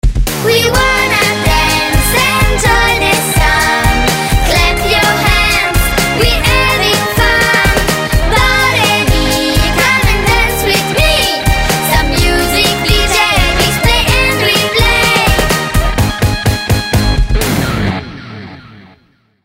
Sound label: RingTone